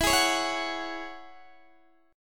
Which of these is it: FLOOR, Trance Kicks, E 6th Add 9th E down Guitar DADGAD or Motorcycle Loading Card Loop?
E 6th Add 9th E down Guitar DADGAD